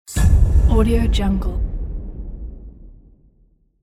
deep impact; infinity sfx productions

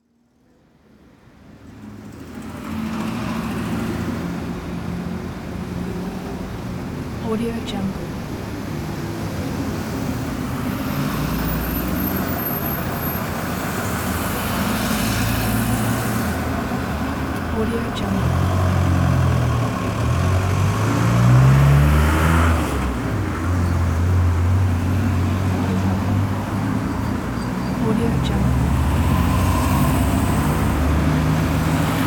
city traffic

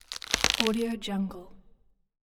branch break

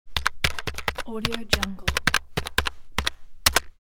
keyboard

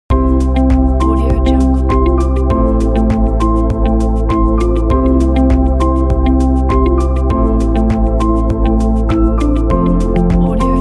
corporate logo

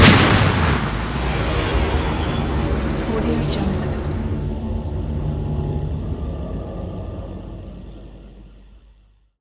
rocket launcher; soundmorph

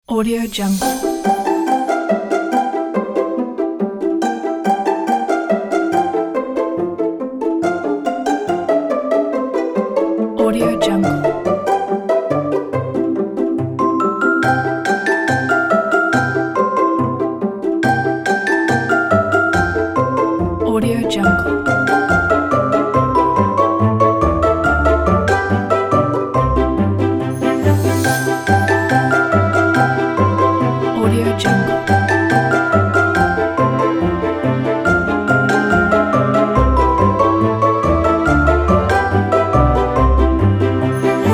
playful happy strings

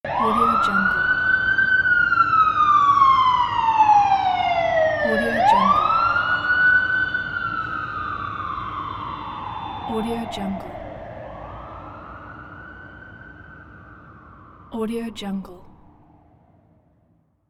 police siren sound

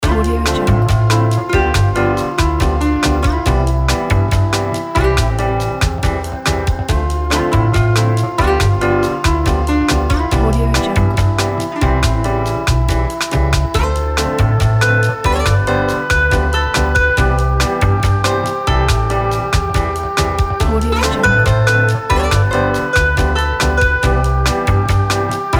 bossa nova elevator music

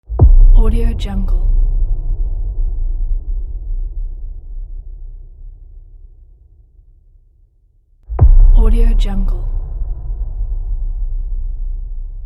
boom hit impact

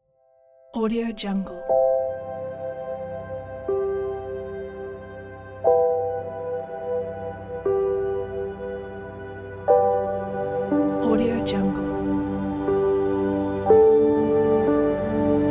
tender and sensitive story

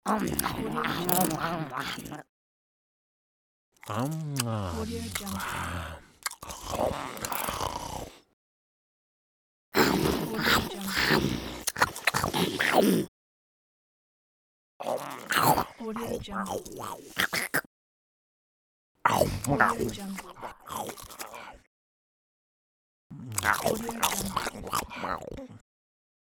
little monsters eating

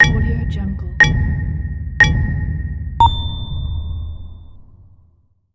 game countdown

